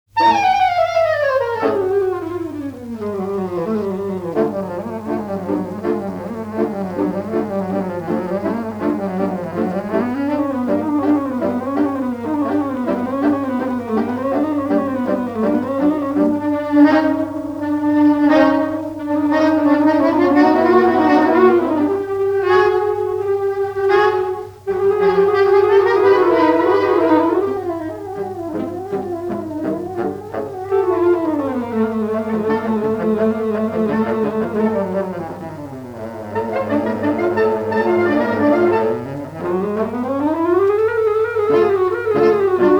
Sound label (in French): quatuor de saxophones; Vol du bourdon